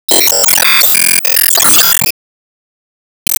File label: Enemy Rose Queen Active Attack Loop